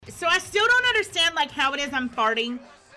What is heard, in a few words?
dont understand farting